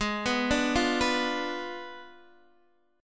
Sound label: Ab Diminished 7th Ab, Guitar, Open D